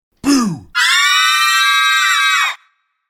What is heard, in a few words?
Scaring The Girls, Male voice says ‘Boo’ with female screams.; Screaming